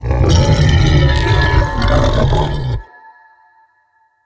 Corrupted Melee Attack Heavy Growl; Vocals; Bromius; Animals

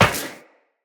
Run Metal Perforated, Footsteps; Tenno

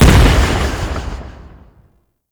Rifle Projectile Explosion, Weapons; Warframe; Zariman Grn Wraith Rifle

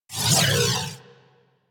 Crewship Turret Proj By, Corpus

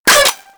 Scope Gun Mech, Weapons; Warframe; Corpus

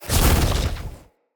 Casting Device Impact; Weapon Impacts; Casting Devices; Fenn

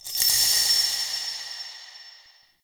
Interior Orbital Station Metal Shake

